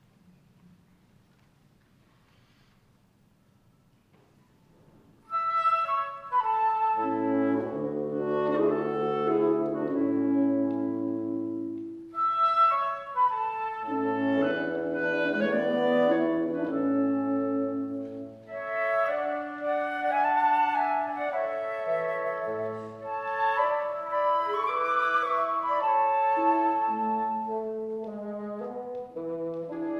Quintet in D Major, Poco Lento, Wind Quintet

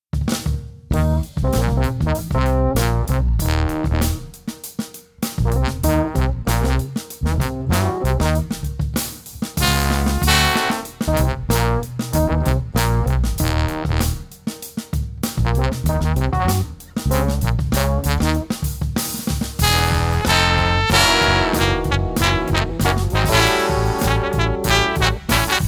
Funk

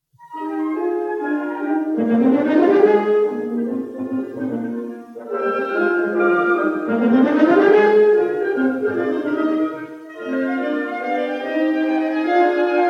Quintet E-Flat Major, Wind Quintet

